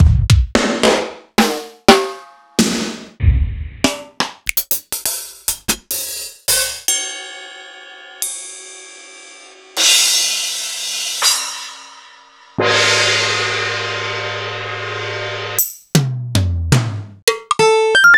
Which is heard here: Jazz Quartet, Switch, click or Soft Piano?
Jazz Quartet